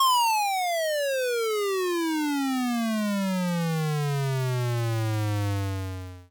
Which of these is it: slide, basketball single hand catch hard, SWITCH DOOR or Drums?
slide